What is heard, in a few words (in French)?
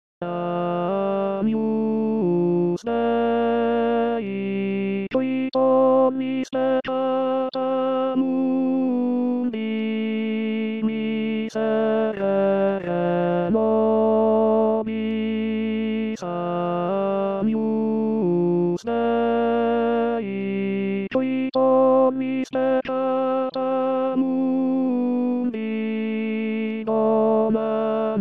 agnus tenor; st jean